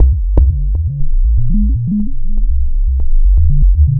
Space Bass, Bass Foundations; Sonic Foundry (Sony Creative Software); The Electronic Music Manuscript Collection

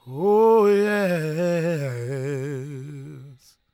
gospel male, vocal; ILIO; Vocal Planet

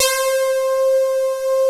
RAVE; Pro-Rec - Synthsonic

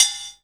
MIX PC, DRUM RYTHM; AKAI S6000 CD-ROM - Volume 1

